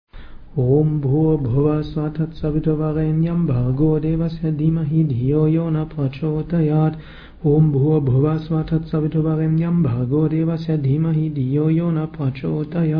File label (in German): gayatri schnell 9x